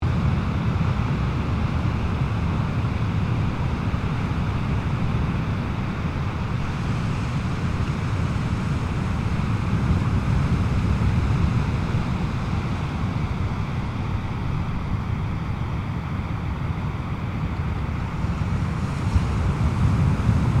Water Waves Beach Medium Distant Loop, Medium waves on beach at Lakes Entrance; Ocean; Nature